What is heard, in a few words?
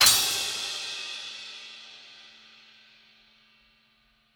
intro splash Start No Voice; netent